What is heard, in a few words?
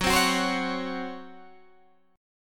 Db Major 7th Suspended 4th Gb